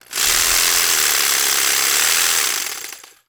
Jig Saw; Tools; 1001 Sound Effects

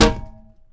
Hit Glass Tank Thud; SFX Kit; Foley - Misc

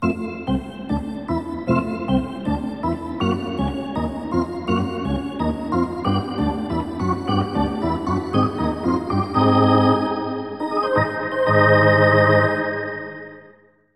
Hockey Organ

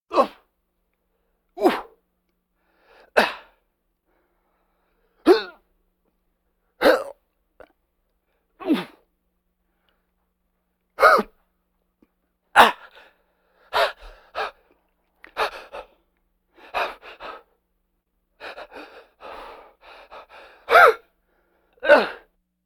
Vocal effects breathing Moaning Baby

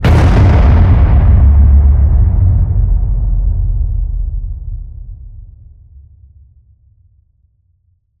HIT Fire, Cinematic Hits Designed

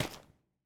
Footsteps; Hero; Blind Survivor

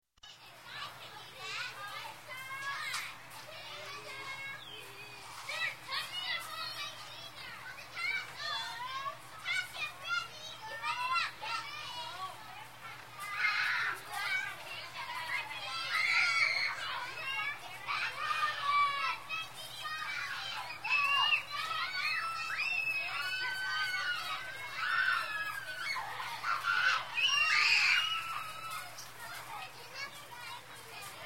Children Outdoor - Playing On Gravel, Yelling; Ambience; Sound Ideas 2000 Series

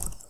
key down release; Apotheorasis

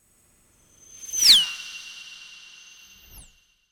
Space Ship By - High Toned Engine, Very Fast; Hollywood Edge; sound designer's toolkit v2

